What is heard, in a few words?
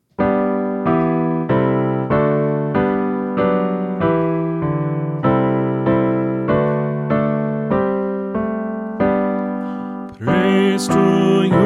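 Gospel Acclamation, Choir Practice Tracks; Good Friday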